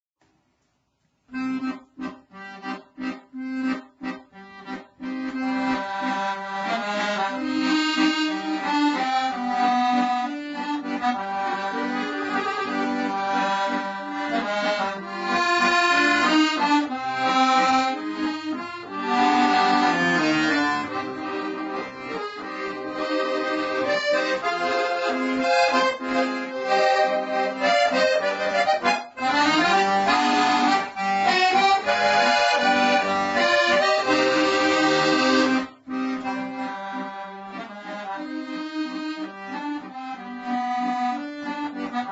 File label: accordion amur waves